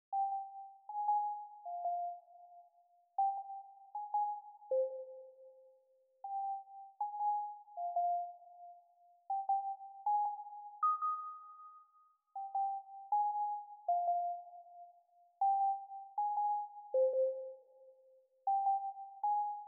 157 c minor Omnisphere

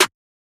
snare trap

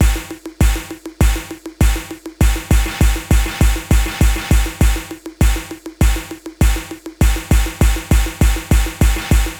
bounce beat 100

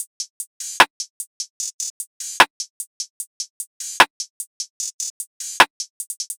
Top Drum Loop 150 BPM; Cymatics - Astro Talk

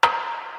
Perc

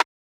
rimshot Snare

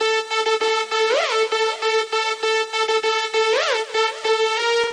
Loop

